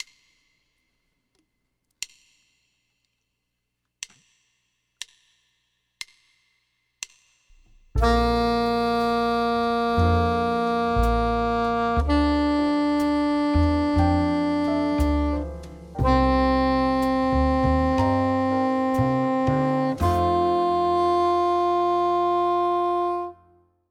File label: Bb Soprano; First Steps